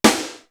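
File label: MODERN SNARE; East West; Global Sounds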